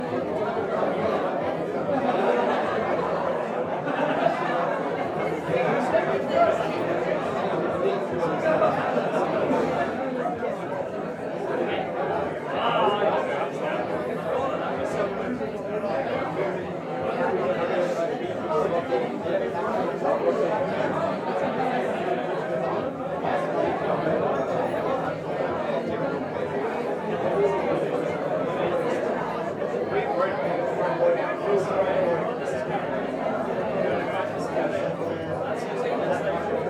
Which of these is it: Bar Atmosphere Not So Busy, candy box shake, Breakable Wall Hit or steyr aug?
Bar Atmosphere Not So Busy